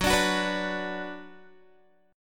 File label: Gb Suspended 4th Sharp 5th, Guitar; strummed